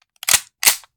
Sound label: Machine Gun Foley; Sound Ideas; Guns